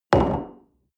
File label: coffee place table medium, restaurant cafe